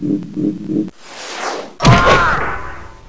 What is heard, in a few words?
body spin